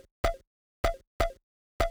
Washer O, dance

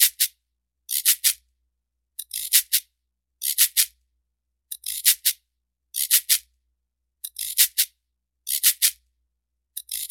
Cabasa 95 BPM; кабаса; перкуссионный

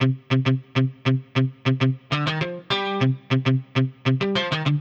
Amp Muted; лупы

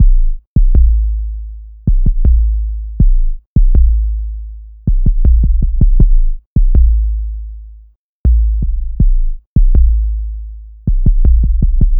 Just Base, ambient

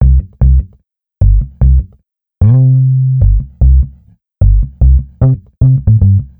True Mute, reggae регги

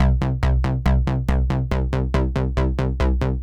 Eight Bass, бас техно транс

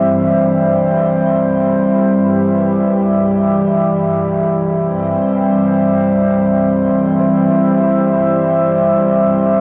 Solitude Pad; пэды

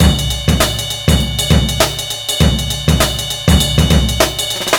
Old Drums, funk

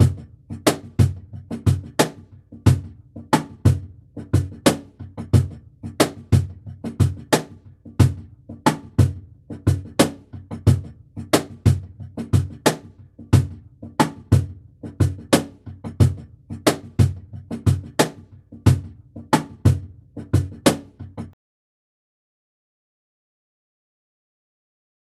Kajone 90 BPM; перкуссии percussion loops

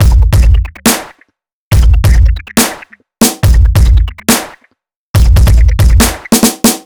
Aaiight A, 140 bpm

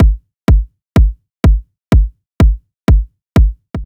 Add Kick B; Disco House

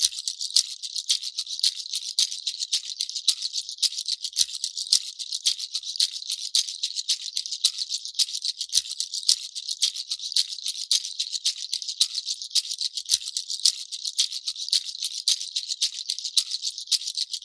Goat Hooves 110 BPM, перкуссионный аудио сэмлп

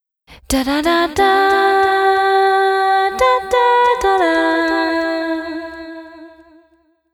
женский вокал

